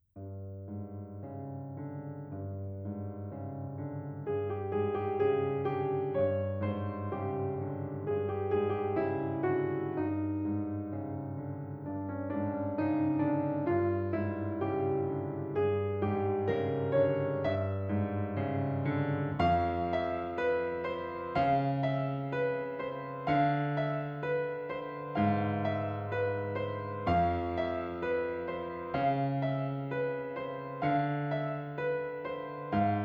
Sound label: slimy fairy; piano